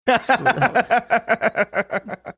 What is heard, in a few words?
cute laugh